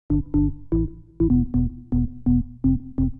organ house 125 A; eJay; Keys

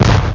CANNON; Amiga; odyssey

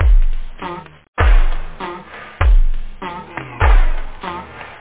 katang, loop house; da capo vol1; instruments

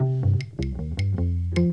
menu command; PLUS! Themes

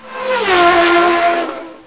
flash; PC Gamer 34

